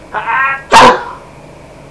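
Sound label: sneezing; People; Sound Library 2000; Wayzata Technology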